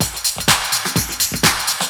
loop; SoundFactory; SMPMANIA

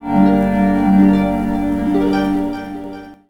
Strings

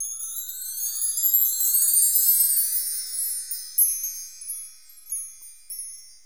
chime sound; SoundFactory; FX

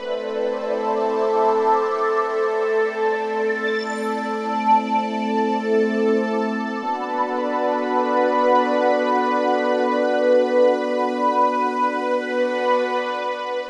Pad, Synthesizers

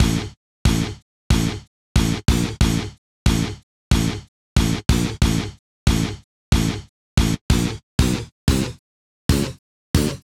Electric Guitar, Loops; Guitars

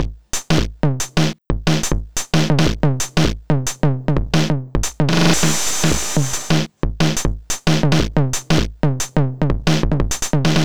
Electronica Drumloop; Drums

